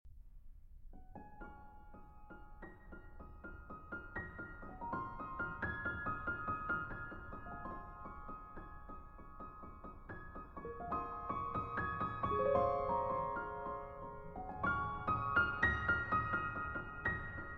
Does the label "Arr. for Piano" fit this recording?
Yes